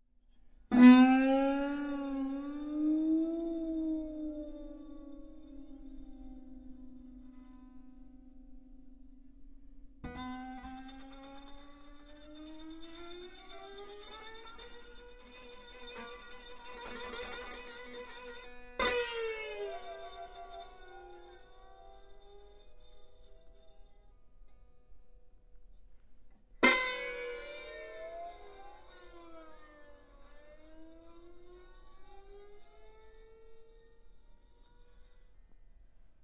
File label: slides, Kannel; Samuti on võimalik mängida ühe keele peal libisevaid meloodiad, teatud määral sarnaselt havai kitarrile.